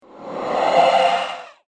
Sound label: Wyvern Attacks; Monster Sound Effects; fantasy; role playing games, magic videogames